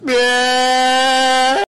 guy starts crying after going underwater, Crying Kid; fat black guy starts crying after going underwater